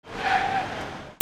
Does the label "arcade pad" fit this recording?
No